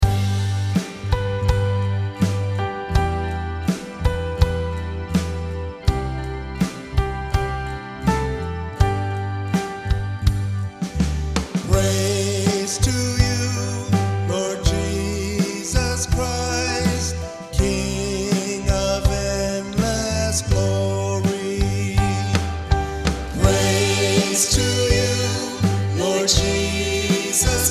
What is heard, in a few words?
Lenten Gospel Acclamation